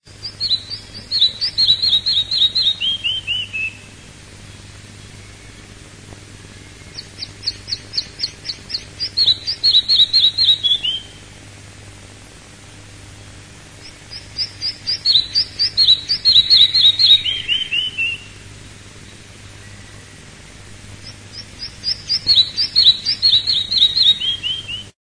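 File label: red-breasted flycatcher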